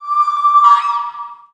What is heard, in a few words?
BERBER, FEM. NOISE, Heart of Africa; Spectrasonics; Heart of Africa 1